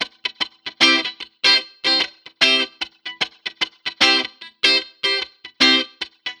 Tweed, сэмпл гитары регги

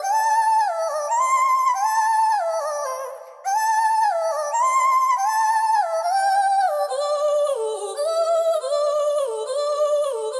Asterio Lead

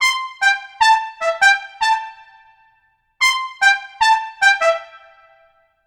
Solo Trumpet; соло

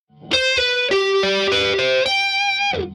Guit Solo; соло

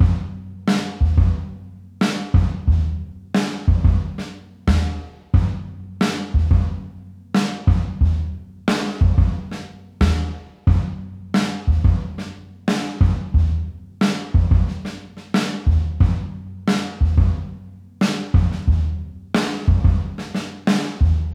Rock a groove B, электро джаз

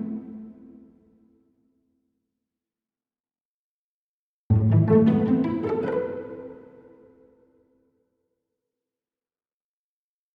Cello pizz; пиццикато

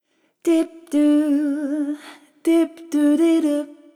Jazzy

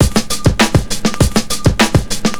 Clapper C

